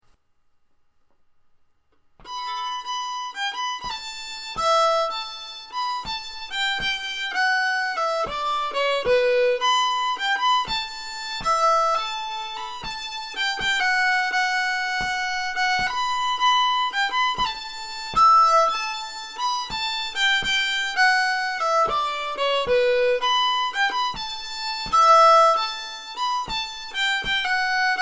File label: Polska Slow